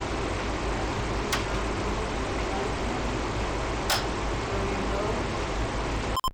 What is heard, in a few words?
EVP Clicks